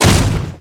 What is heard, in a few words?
step heavy